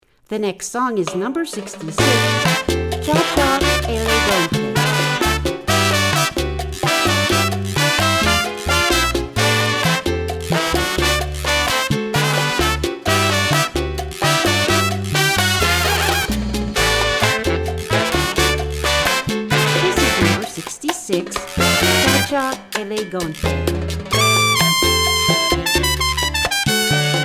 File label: Cha Cha